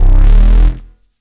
Synth Bass

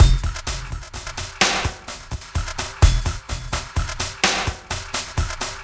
Breakbeat, Drums & Percussion

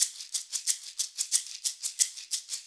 Maraca, acoustic percussion loop; Drums & Percussion; Percussion Loops

